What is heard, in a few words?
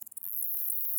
song lucubrata, katydids neduba